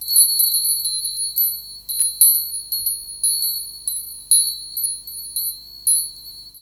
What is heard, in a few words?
bell